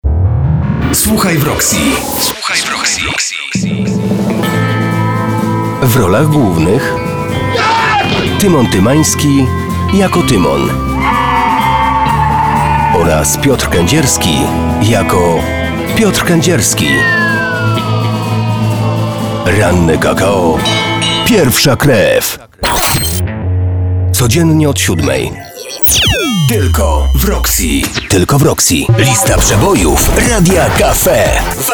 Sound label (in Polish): Jingle Radiowe